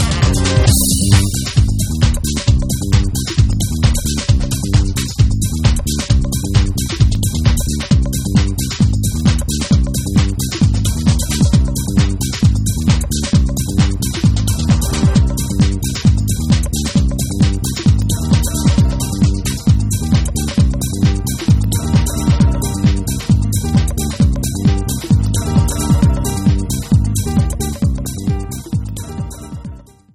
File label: string